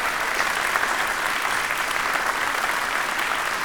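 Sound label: Basketball Crowd Injury Loop; Crowds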